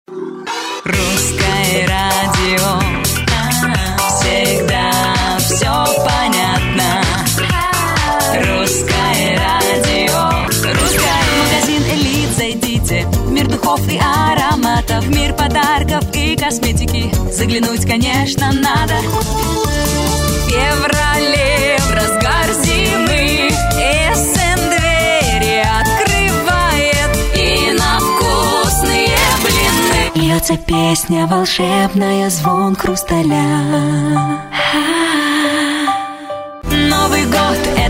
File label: vocal